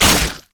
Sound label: ranged attack; LoL; jayce